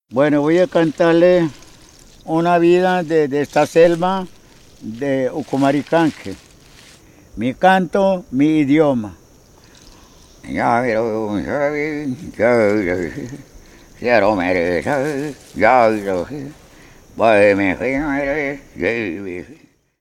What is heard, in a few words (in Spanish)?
Conjuro y canto; jaguar voz de un territorio